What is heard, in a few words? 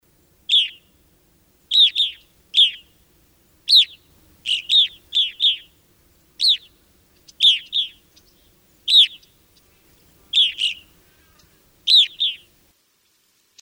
Evening Grosbeak